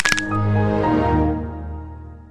broken ankles sfx

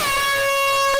very loud airhorn

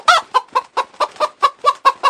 chicken

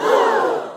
audience gasp

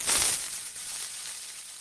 creeper hiss

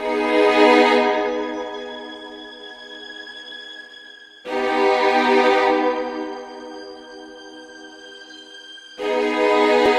suspense; kai cenat

